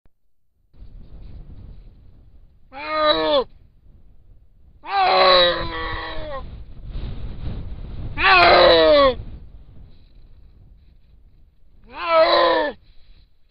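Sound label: snow leopard voice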